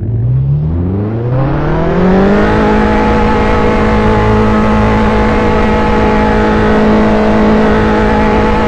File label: rev, vehicles cars; lwcars